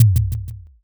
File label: kick